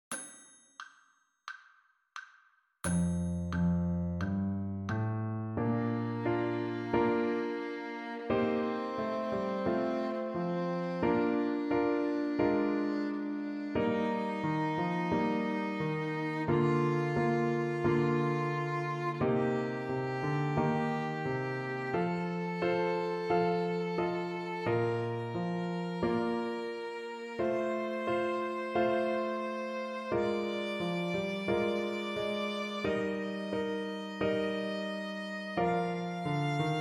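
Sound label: Bb major scale 2 octave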